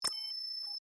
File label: Scanner Read Out, Scifi; Science, Fiction; Tech; Sound Effects:Science